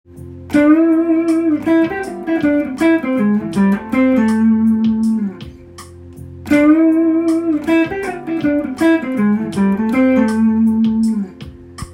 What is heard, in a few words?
blues jazz